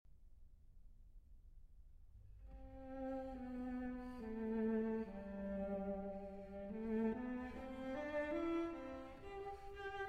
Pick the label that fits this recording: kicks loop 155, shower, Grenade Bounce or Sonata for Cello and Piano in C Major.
Sonata for Cello and Piano in C Major